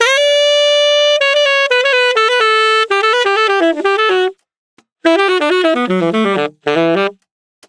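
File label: Bass Mute; Disco House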